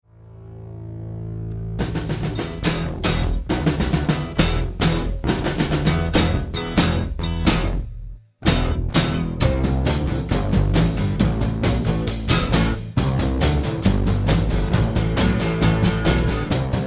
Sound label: Basses Drums Acoustics